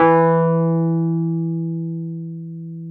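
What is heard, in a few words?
Wurlitzer electric piano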